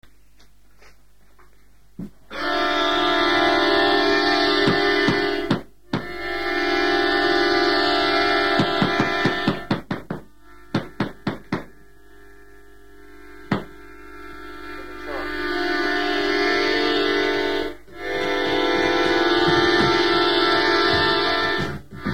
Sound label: instrumental; thrash avantgarde